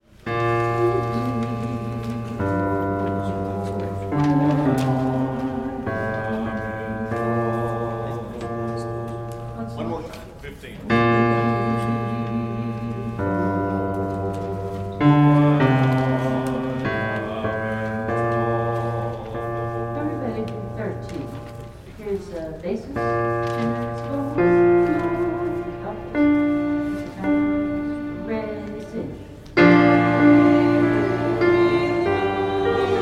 bass

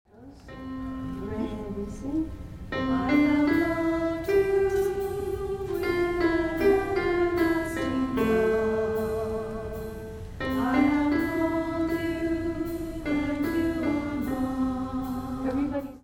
alto; Gather Hymnal

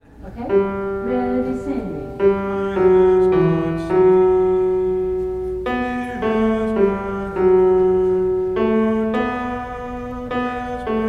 tenor; Gather Hymnal

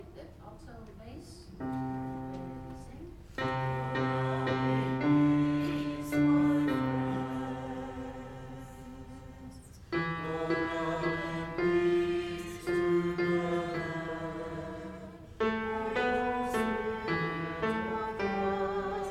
alto and bass

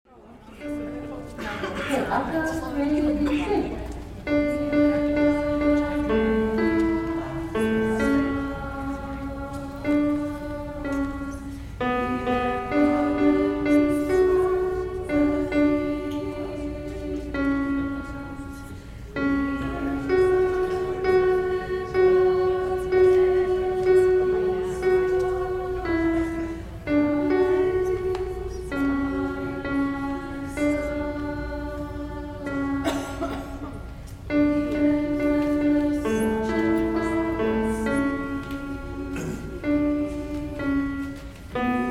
alto; Gather Hymnal

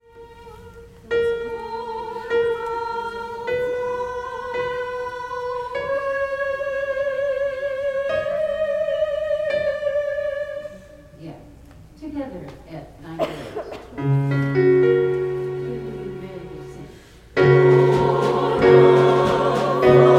soprano on middle note

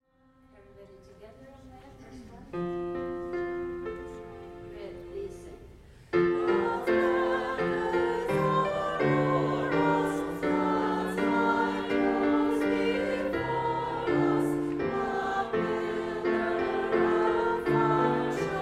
all at harmony part; Gather Hymnal

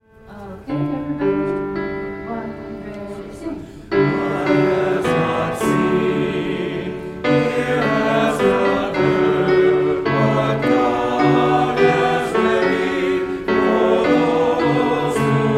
bass tenor alto; Gather Hymnal

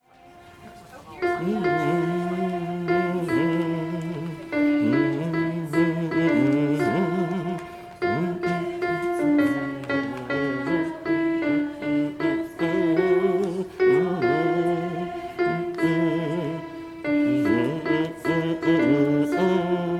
alto

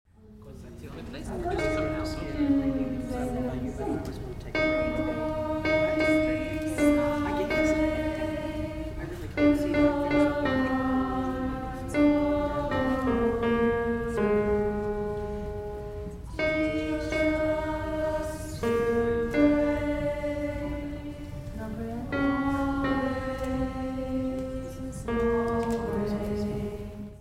alto; Gather Hymnal

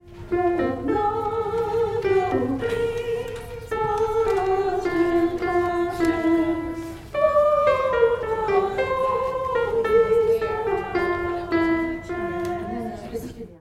coda second soprano; Gather Hymnal

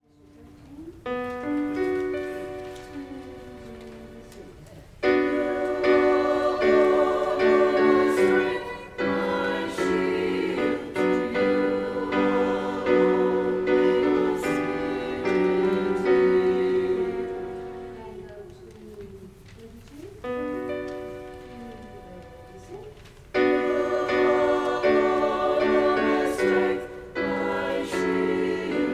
all on harmony parts

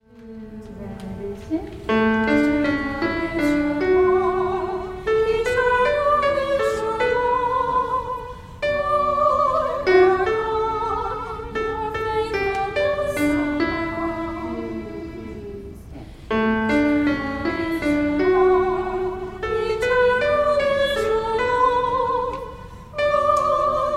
Eternal is Your Love soprano

